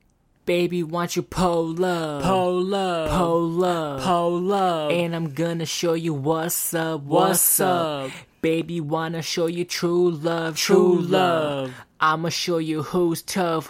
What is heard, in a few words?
HH, Acapella, 135, Em